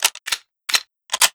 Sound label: scatter gun double reload